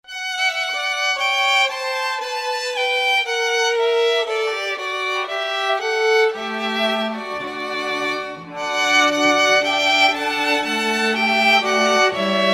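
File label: chamber music string quartet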